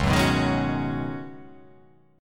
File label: Db 7th Suspended 2nd Suspended 4th; JGuitar